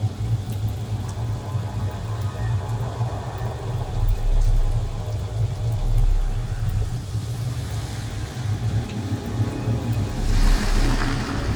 ship corvette idle; Stellaris; machineage

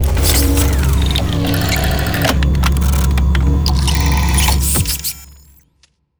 relic activation; Stellaris; ancient relics; the rubricator

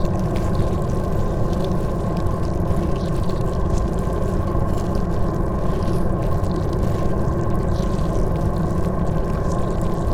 ant ship mauler idle; Stellaris; biogenesis; ships

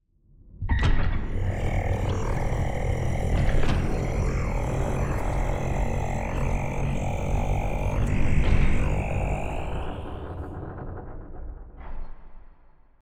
ship colossus open; Stellaris; shroud; ships

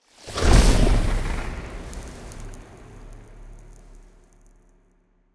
ship corvette move; Stellaris; toxoids; ships

